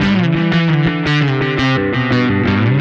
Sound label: Static Bass